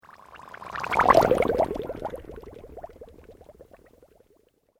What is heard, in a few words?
Sweep Organic Slow